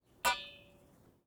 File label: metal pole hits; industrial sounds